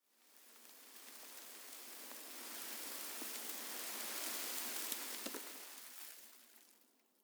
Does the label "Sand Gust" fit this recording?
Yes